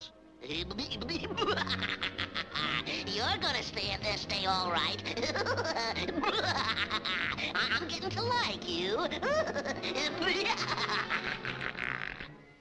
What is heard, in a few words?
gulp gulp